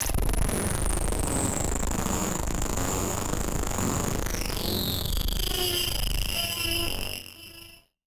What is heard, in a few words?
Battered Synth; malfunctioning synth samples